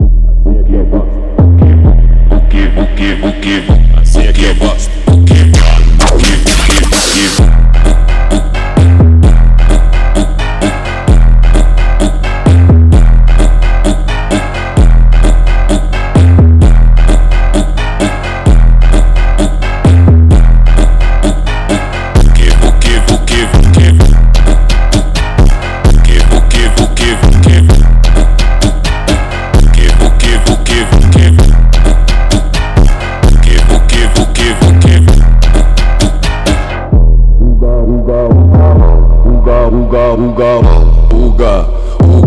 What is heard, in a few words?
Phonk Music; فانک phonk